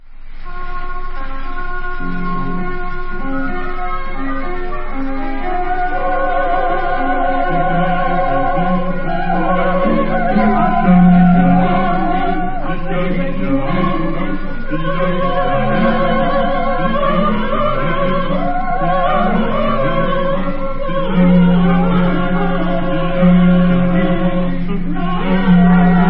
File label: instrumental